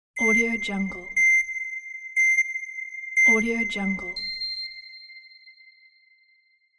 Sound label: game start countdown